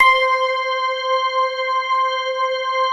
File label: block pads; Pro-Rec - Synthsonic